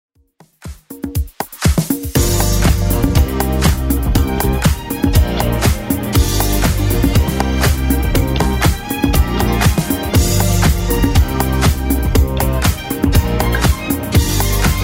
80s Club 120 bpm

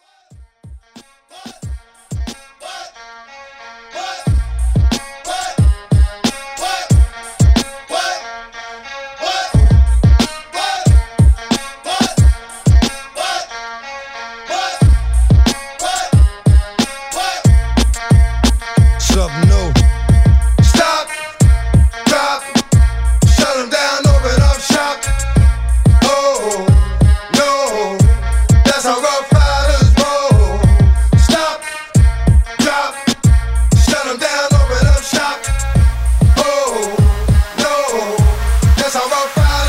To House Transition

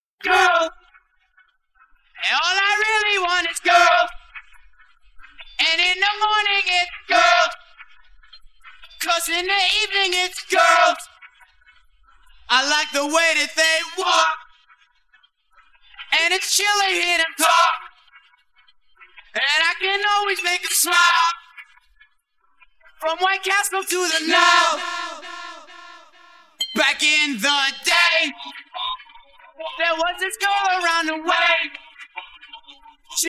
Acapella

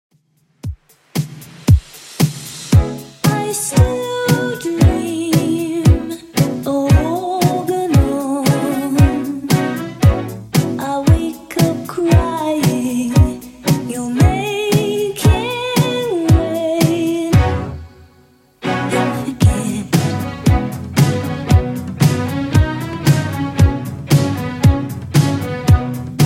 80s Redrum 115bpm

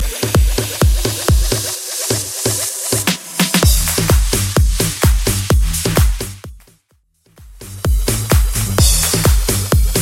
EDM Bootleg Mash Up Festival 128 Bpm

